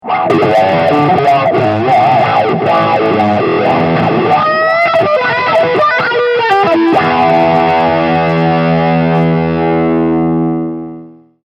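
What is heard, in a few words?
Modern Distortion Wah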